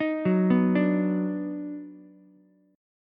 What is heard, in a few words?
D sharp Minor Major 7th Sharp 5th D sharp down Ukulele; arpeggiated; chord